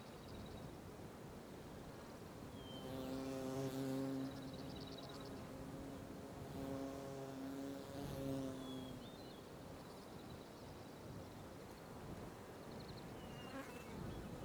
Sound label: Ambience loud flies light birds crickets wind; Wav Junction Sound Effects